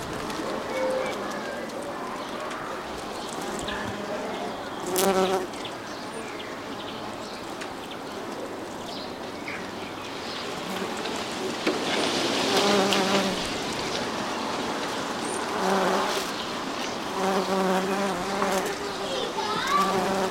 sunday afternoon bees kids in distance rustle of leaves